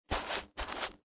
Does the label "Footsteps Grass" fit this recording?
Yes